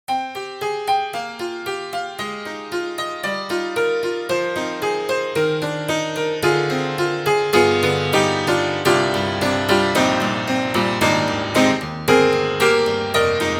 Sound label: Upright Pianos Tack Piano British Berkelry Tack; Synthogy; Ivory II